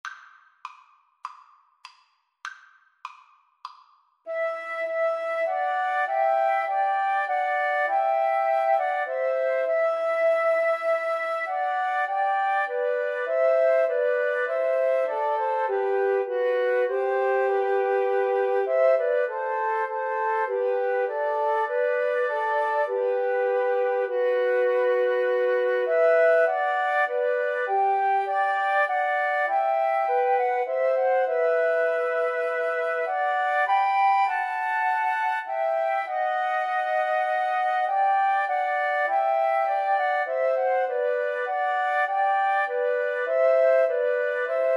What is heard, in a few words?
emmanuel, Flute Trio